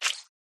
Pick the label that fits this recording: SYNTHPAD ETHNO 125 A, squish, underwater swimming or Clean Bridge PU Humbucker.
squish